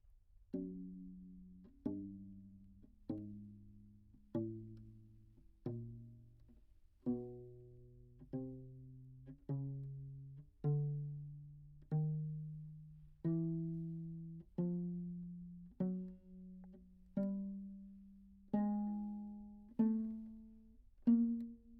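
tenor viol back microphone pizz chromatic ascending, Anechoic; OPENAIR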